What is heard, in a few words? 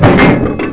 Hit Car; stuntcar